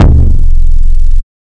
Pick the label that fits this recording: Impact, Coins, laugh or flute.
Impact